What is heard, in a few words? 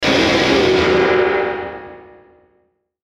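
Musical Element, Drum Snare March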